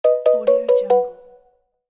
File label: sms message